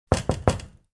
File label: Knocking on window pane